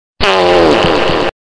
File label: loud echo farts